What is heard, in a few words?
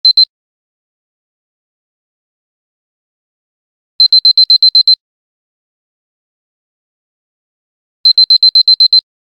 Alarm, ringtones; Lumia Ringtones